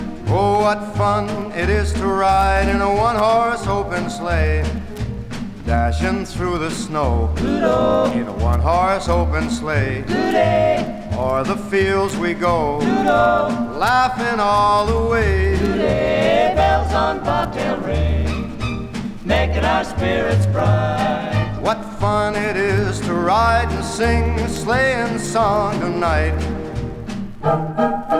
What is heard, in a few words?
Jingle Bells